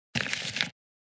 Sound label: UI dumping sound